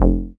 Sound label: bass F1, ARP Odyssey; Polyend; Simulacrum Sounds; 1 Shots; Synths